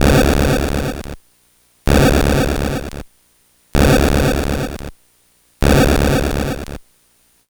Game Boy FX Explosion Loop, chiptune; Nintendo Game Boy

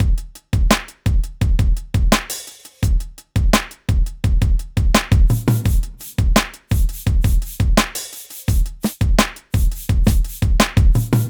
Drum Break, Trip-Hop Loops; Sonic Foundry; Rondo Brothers

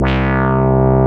Mini bass C2, Mini Bassics Vintage Keyboards; Big Fish Audio - First Call Vintage Keyboards

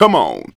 VOCALS; Ueberschall; Jam Box

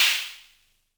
Snare, Univox SR-95

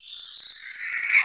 FX REVERSE

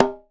ITOLELE, BATA; Voice Crystal - Steve Reid Definitive Percussion

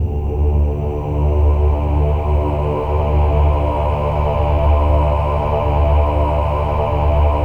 OH-AH D2, choir; Spectrasonics; Symphony of Voices

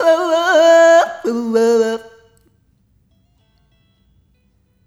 Rhythmic A

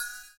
CYM, Ethno Techno; ILIO - Ethno Techno

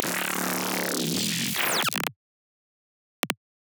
neurobass with otts

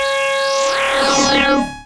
Monotron Sci-Fi

